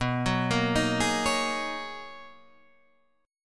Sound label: B Major 13th B down Guitar DADGAD